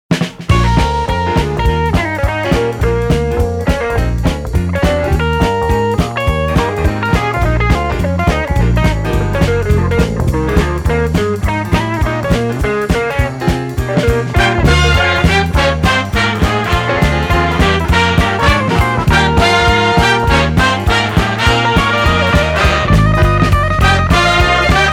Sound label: stomp